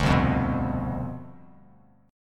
C Major 7th Suspended 2nd Suspended 4th C down, Bass Standard, chord; CM7sus2sus4 strummed; JGuitar